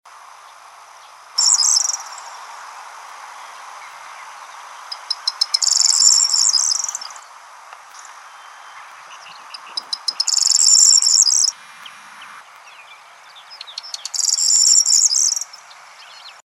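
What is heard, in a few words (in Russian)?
prosjanka, corn bunting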